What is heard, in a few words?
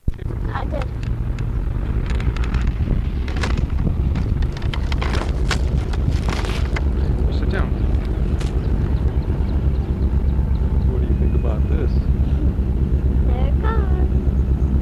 3 trains